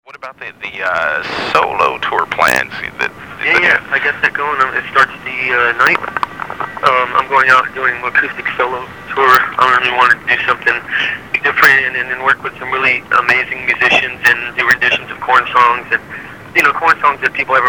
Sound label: solo